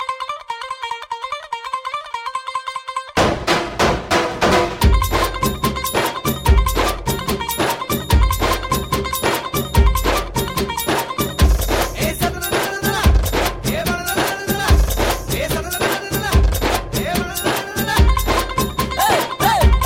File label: Tone